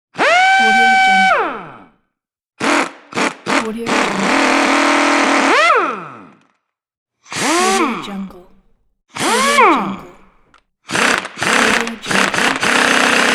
impact wrench

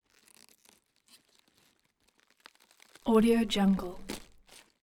gore slow rip; collanderson

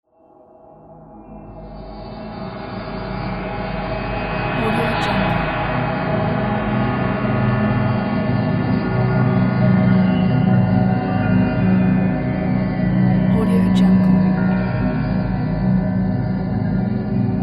mystic place transition

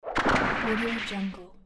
multiply bonus

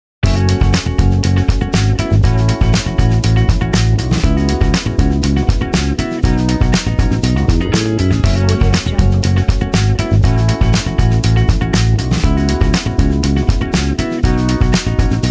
the funk

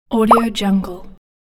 bubble; invisible-aesthetic

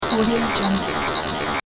tech mech glitch

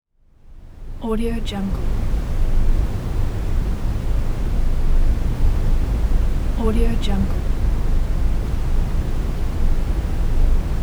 thick noisy space background

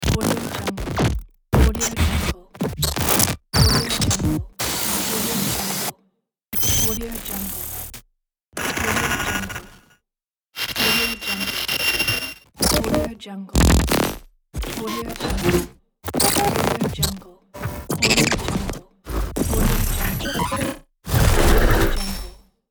glitch noise

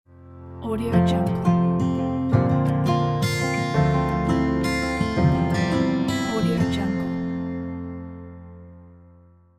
country folk opener

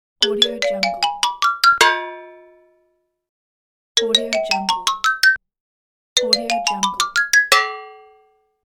comic animated sound

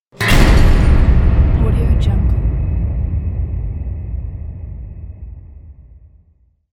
the impact

